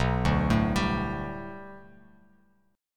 B Minor 7th Sharp 5th B Bass Standard, chord; 7,5,7,12; Bm7#5 arpeggiated; JGuitar

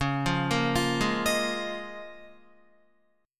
Db 6th Add 9th Db down Guitar; Db6add9 arpeggiated; JGuitar

